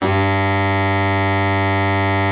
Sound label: BARITON, BARITON SAX